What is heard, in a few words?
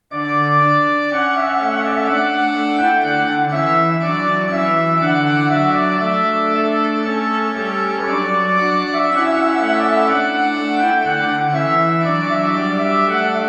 Trumpet Voluntary, organ